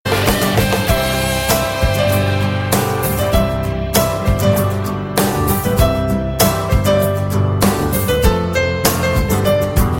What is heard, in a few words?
Sweet Instrumental